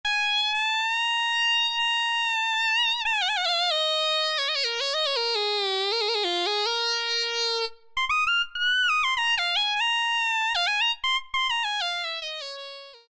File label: Lead, Alesis Micron; Factory Programs; Odyssee Lead